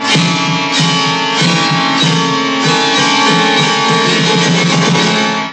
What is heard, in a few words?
Ancient Music FX, Industrial